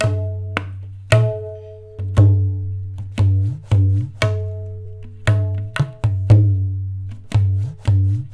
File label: 115 TABLA, Indian Traditions; USB Soundscan vol.45; AKAI